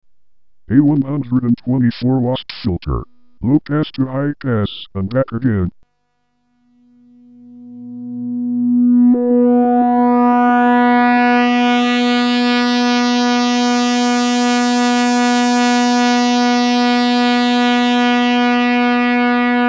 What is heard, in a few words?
EDP Wasp LPF to HPF Delay